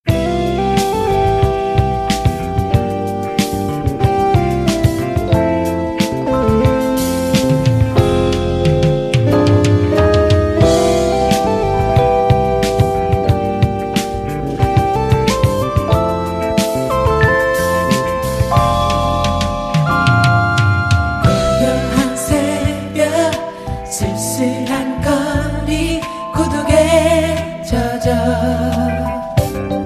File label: funky Korean soul